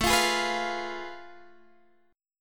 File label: F Diminished A; Fdim/A strummed; JGuitar